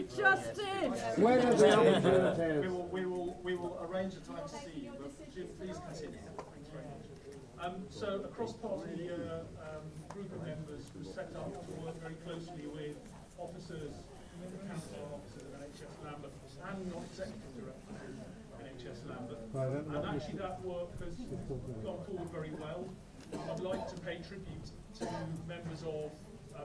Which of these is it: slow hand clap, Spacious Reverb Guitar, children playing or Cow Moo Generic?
slow hand clap